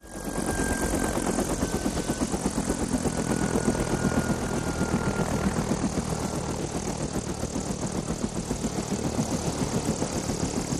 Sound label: UH-1 Huey: Exterior Idle And Power-down